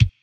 kick; Reference Beat BPM120; j city pop 1980

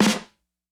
snare; funk

